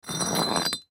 Brick Pick Up; Rock Sound Effects; FOLEY

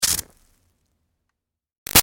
Sizzle Iron Electrical Zap; Zaps (Sound Effects:Electronics); Big Room Sound

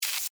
Insect Hiss Attack, Spray, Bug; Animal; Insect Voice; Insect Voice Sound Effects

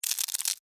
Insect Move Click, Insect, Movement, Clicks, Bug; Blastwave; Animals:Insects

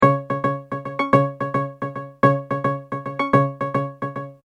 Multimedia Tone-Thinking/Processing; Tones; Sound Design

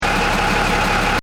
Engine: Tick Over Revs Loop, car; Diesel; Cars; Vehicles; Car Sound Effects; Cars (Sound Effects:Vehicles); Stuart Duffield

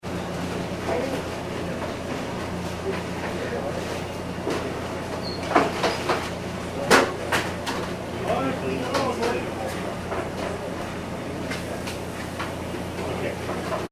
Pizza Takeaway Ambience Nyc; Takeaway Ambience - Pizza Takeaway In Nyc - Few People With Noise Of Chefs Cooking And Equ; Restaurants; Backgrounds; McKinney Sound

